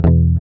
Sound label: GUI E, Acoustic